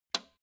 old switch harsh snap

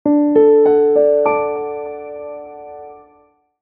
child piano

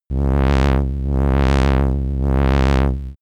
synthetic signal

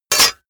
sword block metal

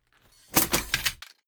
body drop chain leather

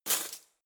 cutlery spoons drawer grab hard

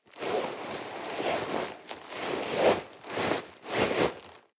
nylon leather medium long

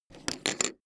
poker chip stack small fall down

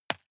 juggle catch medium

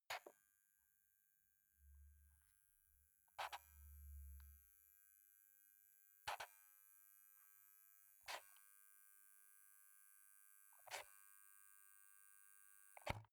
foot switch dimmer slide power increase

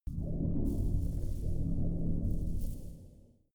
space biomedical engine room

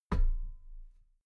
metal ladder step soft muted

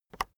keyboard external enter release

